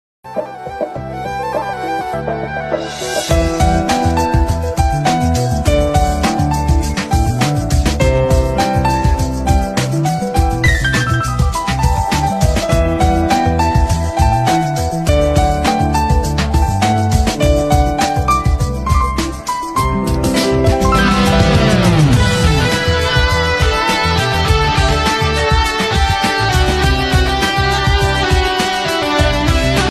ringtone, bgm; new ringtone 2024

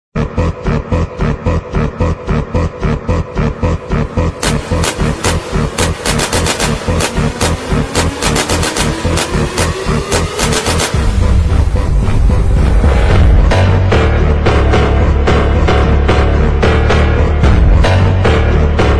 attitude ringtone bgm ringtone; sigma male

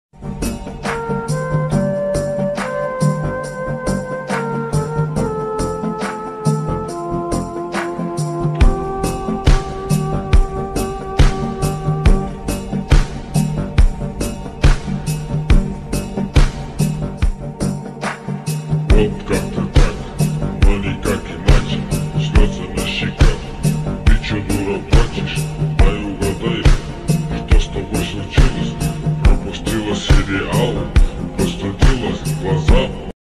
slowed reverb bgm ringtone villain beats

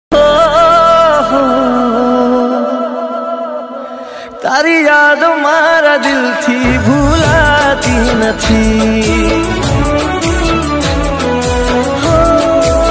song ringtone, gujarati; new ringtone

